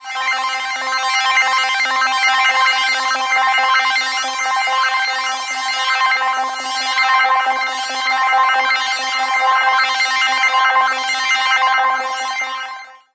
rotational, analogue; Zero-G Ambiosis

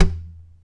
TAP, FLAMENCO Guitars

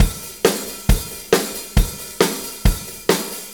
4-4 Loop, Drum Loops, Full Kit Loops

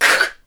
NOISE, CLASSIC VOX; Best Service; Hallelujah

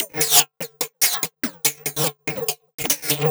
145 Perc, Drum Loops; Zero-G; Soundclash Nu Dance Electro; Acidized Wavs; 145bpm; Undercore

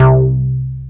MOOG; OLD SYNTHS; Rubber Chicken Software Sample Bank

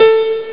acid piano; Amiga Soundtracker Sample Packs

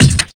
RSS, 160 BPM; Ueberschall; Drum & Bass Flash Trackz

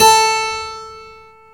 KEY HRPCR, Roland; Roland - LA Composer vol. 1

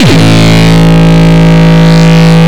Longkick, kicks

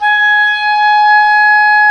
WND OBOE, Oboe Room

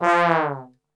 F 1 TRB, Brass Super Section; Best Service; 90 s Sample CDs

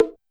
Bongo L; YAMAHA RM1X

